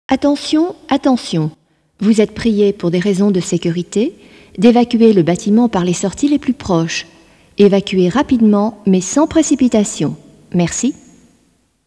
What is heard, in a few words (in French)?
JINGLE; call fire emergency; Anti Jingles et Curiosites